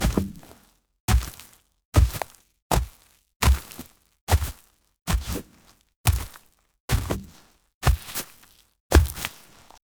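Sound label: grassy heavy dirty footsteps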